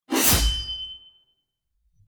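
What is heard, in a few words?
Sword Slash Transition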